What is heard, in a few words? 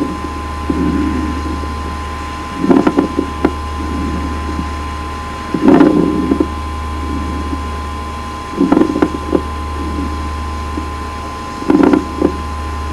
COURSE RALES, airway; emt